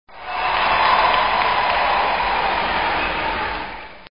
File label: cheering